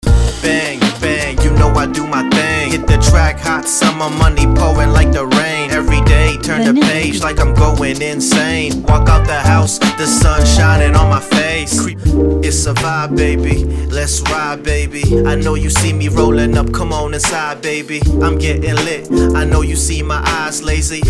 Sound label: Hip Hop Vocals